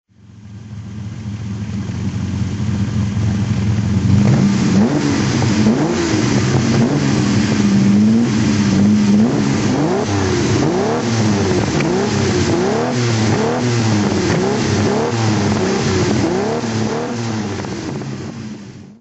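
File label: ferrari 330gt throttle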